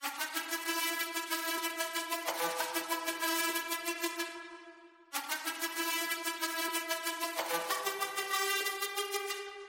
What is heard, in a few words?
Bollywood Movie Bgm Ringtone; Uri